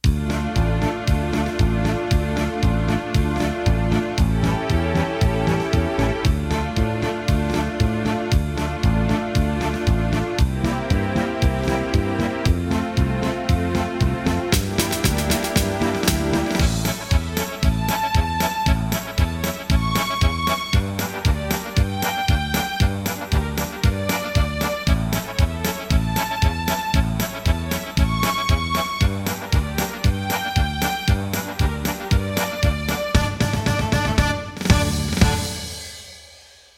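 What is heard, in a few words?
Paso Doble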